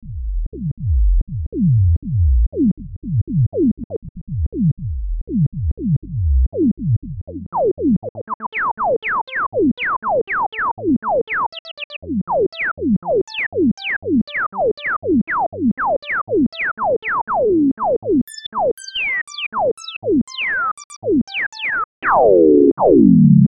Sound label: terratec komplexer vsti filter demo lpf24